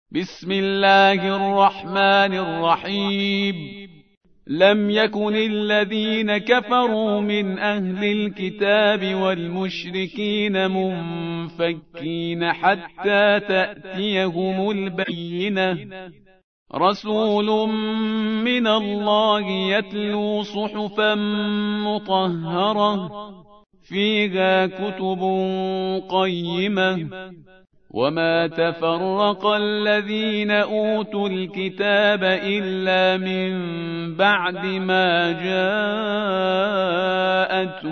bayyinah surah

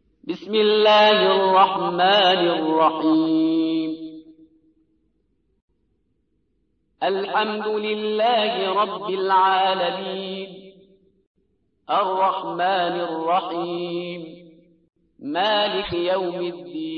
fatiha surah

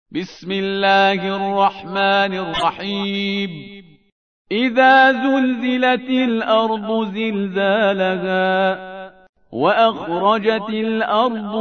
Al-Zalzalah surah

